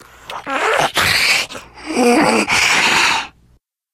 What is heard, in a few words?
spitter lurk, voice idle